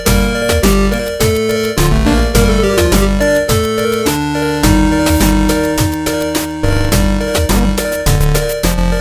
8Bit; World of Tanks

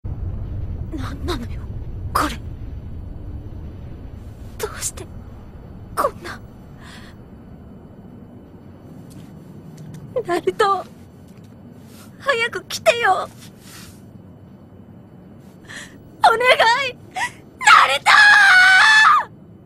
Crying

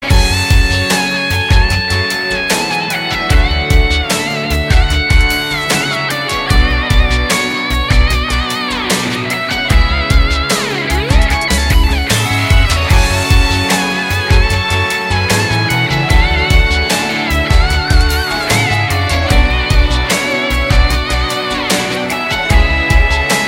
Guitar

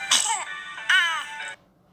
Baby sneeze

